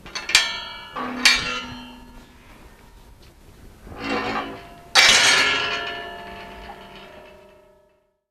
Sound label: Heavy Metal Gate